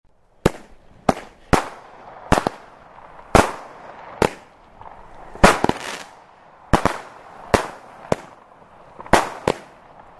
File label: fireworks